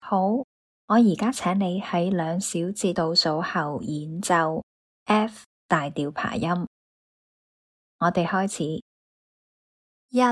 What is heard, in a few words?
f maj arp b maj arp